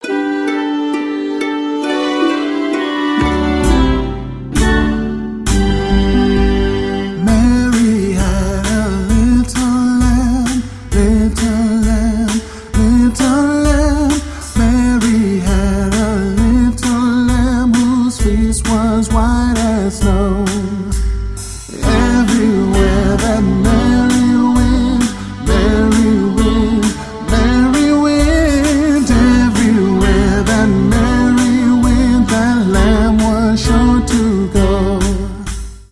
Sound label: Mary Had a Little Lamb, Player Piano